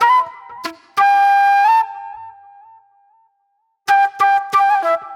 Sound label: Sharp Delay Flute